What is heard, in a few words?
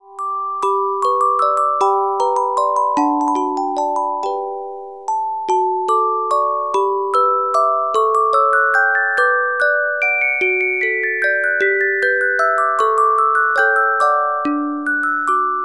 Carillon Music Box Melodies